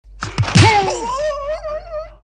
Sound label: FIGHT FIST; BRUITAGES